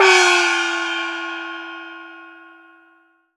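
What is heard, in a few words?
chinese cymbal low; AKAI S6000 CD-ROM - Volume 5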